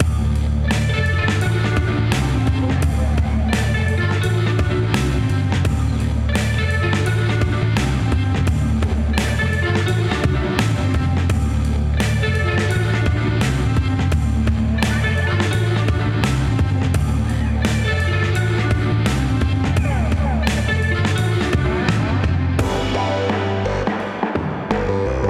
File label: Music Positive; Titan RAW